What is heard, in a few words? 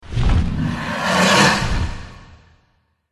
dragon boss attack voice up